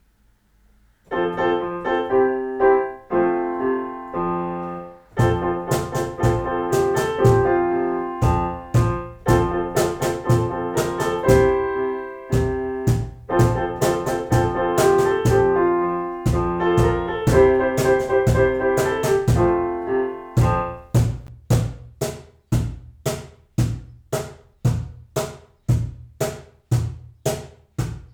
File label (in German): klavier cajon; wir brauchen alle; Weltkindertag am 20. September 2025